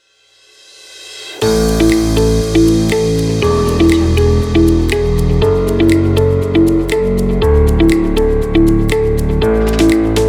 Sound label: inspiring pop